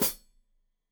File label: Hat Foot, Hi-Hat, Cymbals Stereo